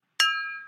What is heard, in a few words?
ting; mozilla-central; webaudio